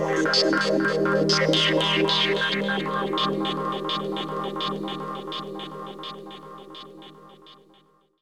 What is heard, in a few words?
Fountain Burble Synth; Jungle; Blu Mar Ten - Jungle Jungle - 1989 to 1999 Samplepack